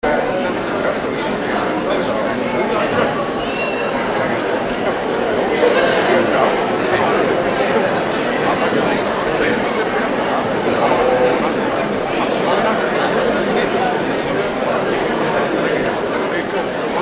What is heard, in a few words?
concert hubbub